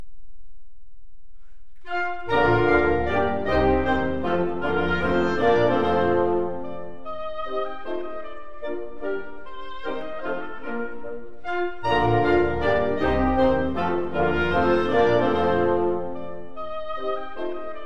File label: Serenade in B flat, Wind Serenades; Strauss & Mozart; K. 361; 370a Gran Partita - Mvt. 4. Menuetto; Allegretto; Adagio